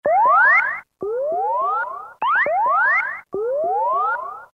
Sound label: Sci-Fi; Roland M-DC1